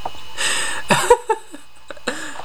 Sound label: laugh